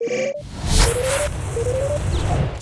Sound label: monolith start; netent